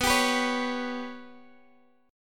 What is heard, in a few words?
B Suspended 2nd Sharp 5th B, chord